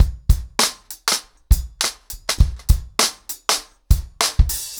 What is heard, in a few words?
100BPM, ACOUSTIC DRUMS; The Roots Drumloops